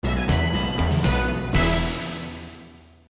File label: drumroll win; nancy drew the phantom of venice windows gamerip 2008